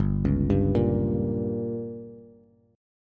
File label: G Diminished, Bass; arpeggiated; JGuitar